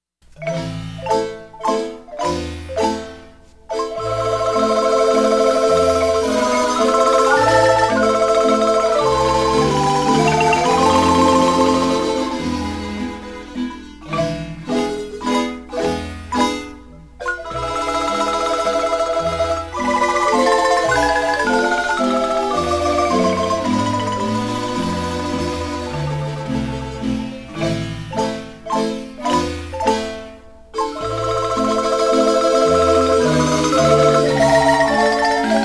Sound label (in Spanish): marimba, musica guanacasteca; vals